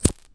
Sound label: fire